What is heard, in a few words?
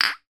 2 beer bottles hit together; foley